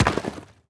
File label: house hit; crocs; arboreans